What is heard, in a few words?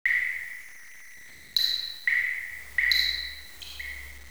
cave, outdoors